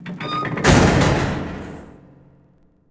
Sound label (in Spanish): Cerrar programa